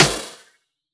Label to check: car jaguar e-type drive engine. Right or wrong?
Wrong